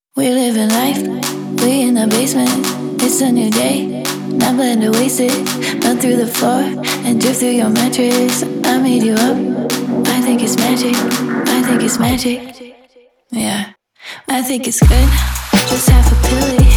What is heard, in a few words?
magic mood maze